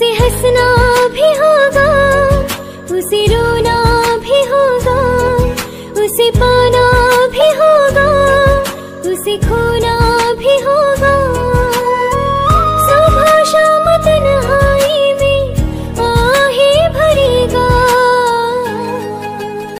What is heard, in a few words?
female ringtone, bollywood